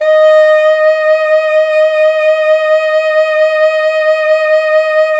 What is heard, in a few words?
Pop Brass D#4 L; Best Service ProSamples vol.19; AKAI